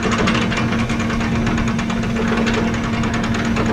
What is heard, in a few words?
tank turret; vehicle; Spearhead Demo